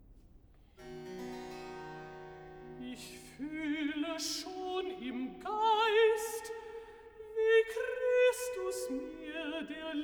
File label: Recitativo (Alt), BACH for ALTO; Die Himmel erzдhlen die Ehre Gottes; Ich fьhle schon im Geist